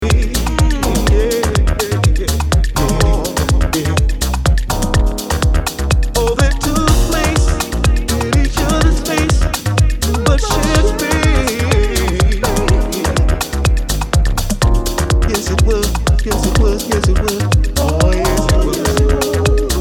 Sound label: drums remix